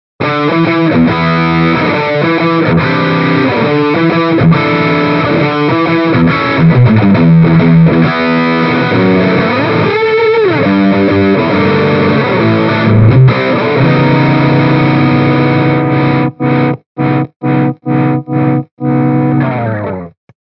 Mini Rox Mic Inside Nordik Gjallarhorn Distortion Les Paul